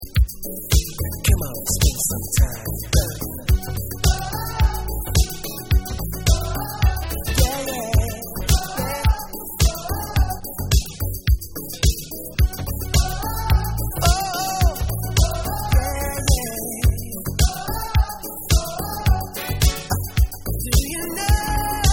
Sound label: Vocal Remix